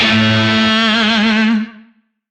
Shawzin A; Warframe; Lizzie Shawzin

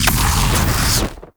Flame Thrower Shot; Drill Sgt

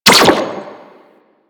Sentinel Laser Rifle Fire; DE; Warframe; Weapons

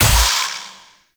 Assassinate Enemy Fusion; Warframe; Infested Eris; Encounters

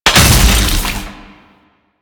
Shotgun Finisher Hit; Sentient; Warframe; Quests; The New War; Kahl; Weapons

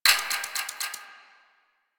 Hack Minigame UI Time Tick C

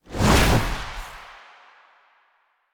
Fuel Projectile Throw; Warframe; Jade Shadows; Ascension

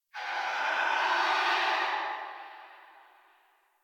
Tree Distant Critters Angry, Ambience

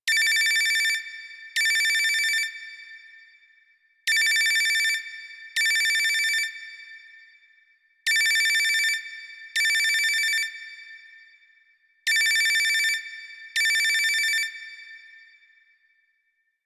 Digital Phone Ring Loop; Warframe; Ambience; Nineteen Ninety Nine

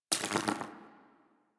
Minigun Mech

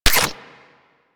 Inf Punch Life Steal H; Warframe; Weapons; Infested; Inf Kick Punch

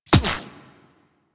Shield Impact

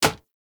Bow Impact Default; Weapon Impacts; Player; Bows; Hunter Bow; Environment; Envoy Hunter Bow

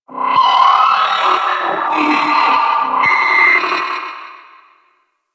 Eye Pain Cry; Ambience; Orokin; Warframe; Orokin Moon

